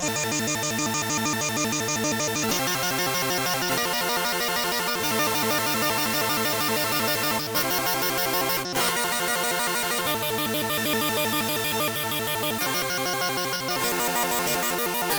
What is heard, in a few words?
D fifths